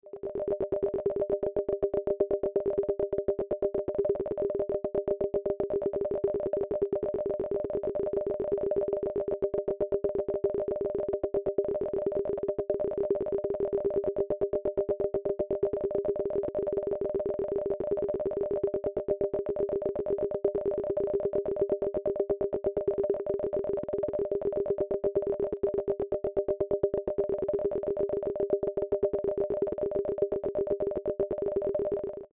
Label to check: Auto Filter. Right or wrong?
Wrong